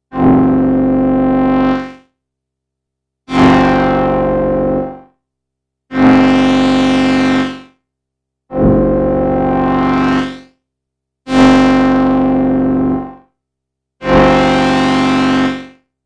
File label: Mini Brute Void Oszillators Nachbau